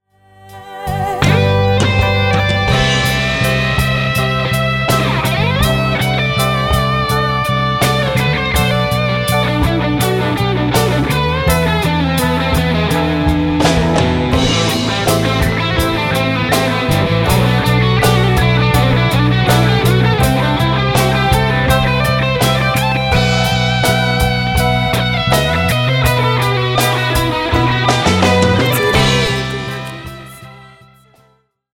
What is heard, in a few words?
boss overdrive